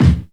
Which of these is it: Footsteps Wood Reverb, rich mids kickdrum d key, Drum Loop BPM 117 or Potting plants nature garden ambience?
rich mids kickdrum d key